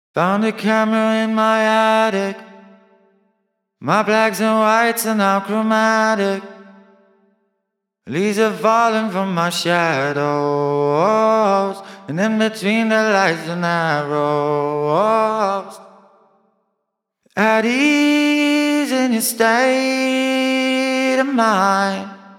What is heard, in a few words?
vocal reverb after